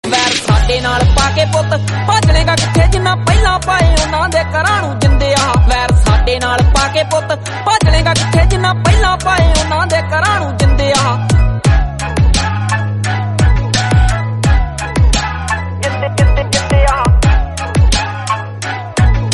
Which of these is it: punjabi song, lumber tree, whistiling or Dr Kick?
punjabi song